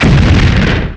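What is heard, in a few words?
Laser Hit Interior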